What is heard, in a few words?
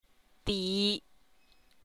tone